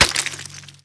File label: smoke grenade land, weapons foley; Spearhead Demo; MacFormat 2003 June